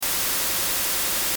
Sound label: white noise